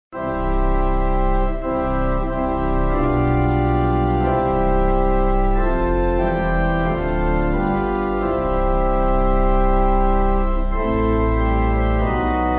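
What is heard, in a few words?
Pipe organ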